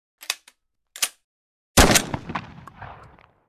Benelli M4 load and shot

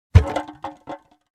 Paint can setting down

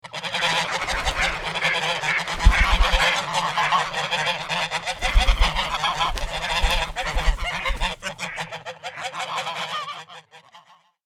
Flock of ducks quacks and wings; bird

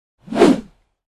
Fast whoosh metal crowbar

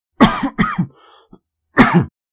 Old man coughing

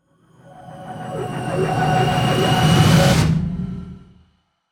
Action trailer tension building

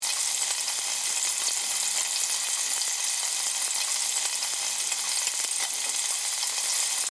Hot oil sizzle cooking loop

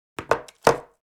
Wood hairbrush set down

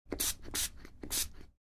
Windex bottle spraying many times

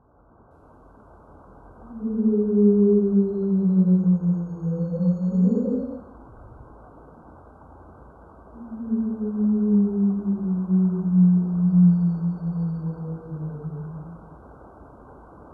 Whale call underwater perspective

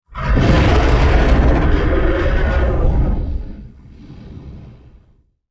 T Rex dinosaur roar attack

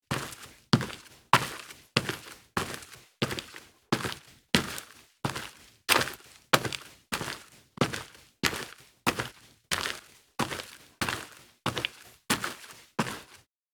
Footsteps walking on dirt sneakers

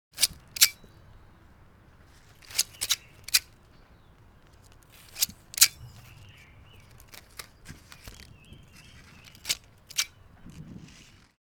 Garden shears cutting bush leaves

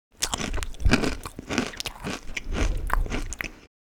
Eating crunchy snacks mouth closed

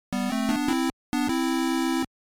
Retro 16 bit or 8 bit success melody win victory

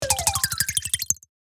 Casino slot machine small reward

